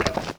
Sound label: step metal, footsteps